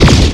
plasma

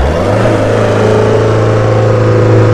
shift down, alfa romeo

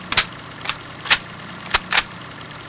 reload, weapons; cso; turbulent3

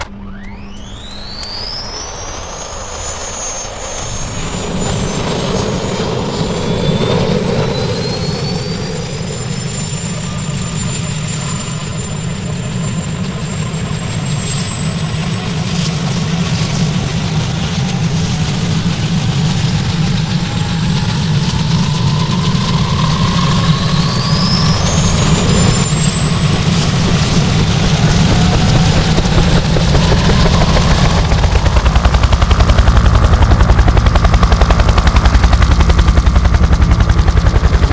start, ch47; wac

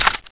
scar clip out; cso; scar oz

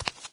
step, fat zombie; doom3

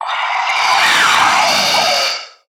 alert, zomb female; nmr

